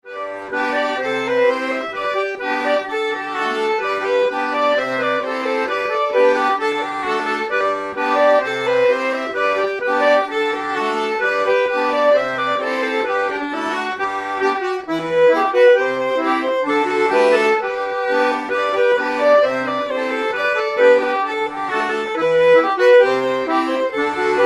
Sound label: Schottische